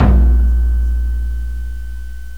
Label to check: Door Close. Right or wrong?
Wrong